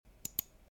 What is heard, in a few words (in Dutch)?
klik